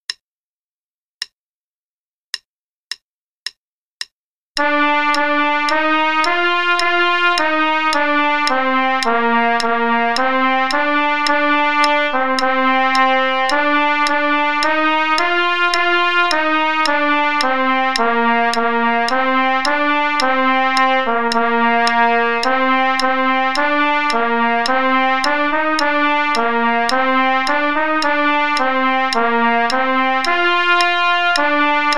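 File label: Play-a-long, quarter note = 108, trumpet; Ode To Joy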